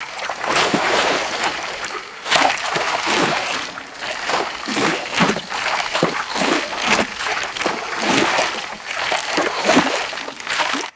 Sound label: heavy footsteps wading through water